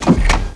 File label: Step